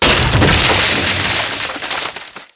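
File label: break wood large; Spearhead Demo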